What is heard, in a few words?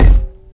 physics cube sm; Portal 2